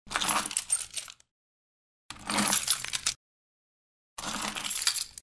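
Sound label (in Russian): zvuk svjazki kljuchej, bunch of keys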